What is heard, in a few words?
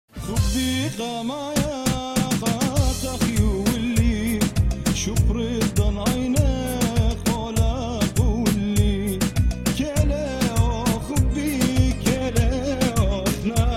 Assyrian Music